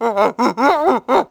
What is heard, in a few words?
bigfoot; portland